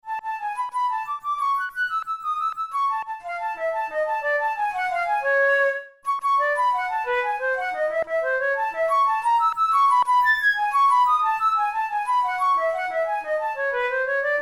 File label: studies for flute solo; karg-elert